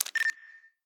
Phone Select

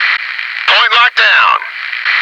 noice

